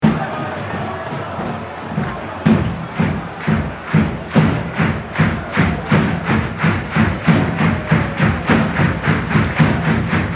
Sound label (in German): Klatschen